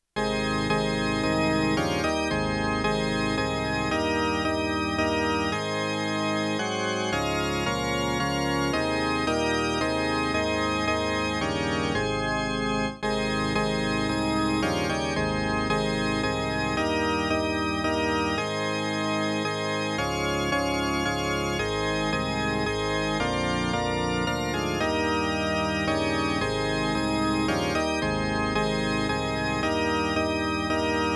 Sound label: To God be the glory